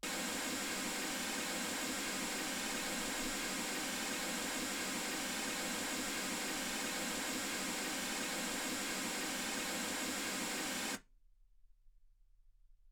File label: mls16 48kHz